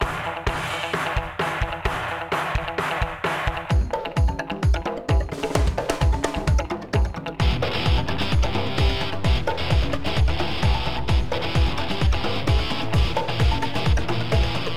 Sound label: Action START; bolt 2008 pc gamerip